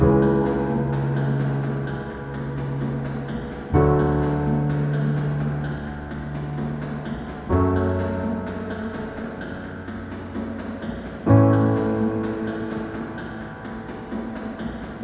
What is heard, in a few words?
song